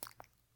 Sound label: lip sound; SEXUAL sounds; ROBLOX GAME ASSETS